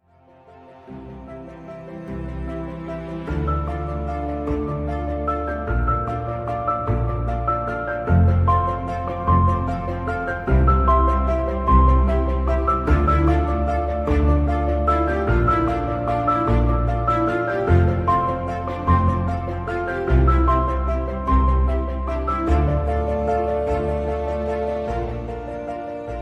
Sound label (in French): melodie